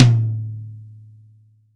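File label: ROCK MID-TOM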